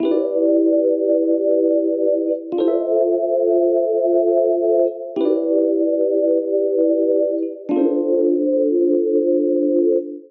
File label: Neo Soul Glide Pad A Minor 93 bpm; MIDI Clips; Tonal; Accompaniment; Ableton; Factory Packs; Chop and Swing